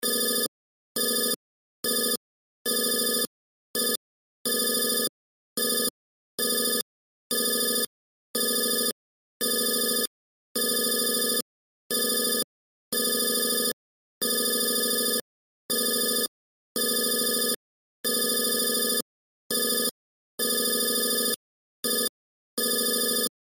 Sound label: Sci Fi Computer Screen Readout Digital Display Loop